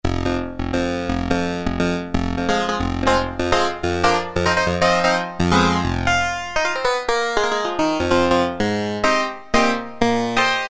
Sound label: Clavichord, Roland Alpha Juno-2; Factory B